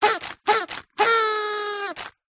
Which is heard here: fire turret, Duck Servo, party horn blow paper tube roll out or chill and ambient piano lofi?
party horn blow paper tube roll out